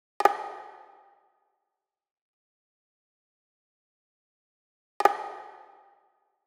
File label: perc loop 100, Pop, Drums; Loops; Slate; SD PASADENA Pop Sample Pack